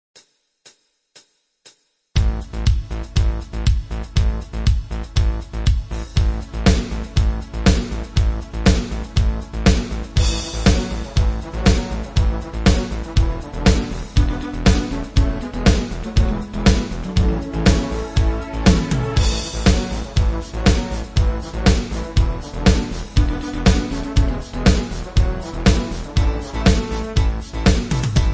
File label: bpm 120 fox mix